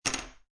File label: Poker Chips Drop One Chip On Wood Table; Gambling Sound Effects; Lynne Music